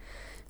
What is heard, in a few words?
female breath in; Apotheorasis